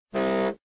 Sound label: wrong